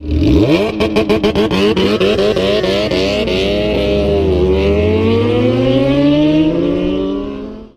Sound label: Launch Control